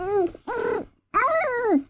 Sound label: drowning; birdo; SM64 CO-OP